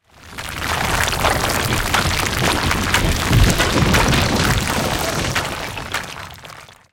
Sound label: building crash, tanks; War Thunder